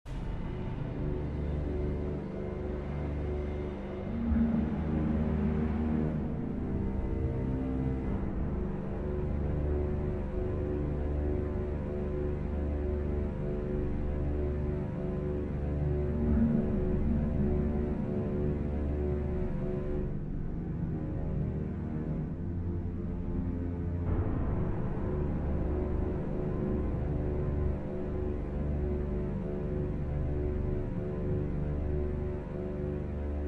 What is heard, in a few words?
Ambient Mission